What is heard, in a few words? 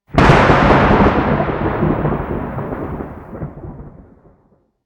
Thunderclap